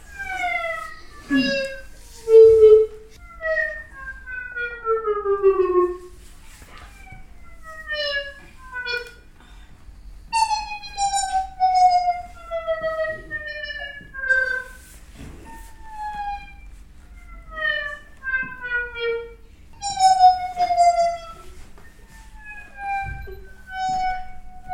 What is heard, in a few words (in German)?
Vogelgezwitscher; Mrs Donovan